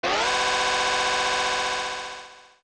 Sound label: Bombchu Fade; Bombchu running (edited to fade out); zelda; OOT